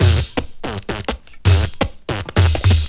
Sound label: beatboxing